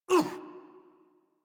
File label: Generic Short Pain Operator Voice en; Warframe; Operator Mission